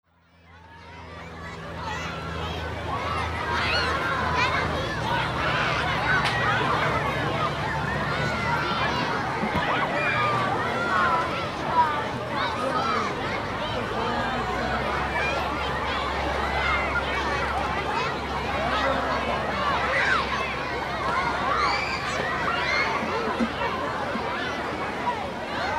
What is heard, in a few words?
lakeside with children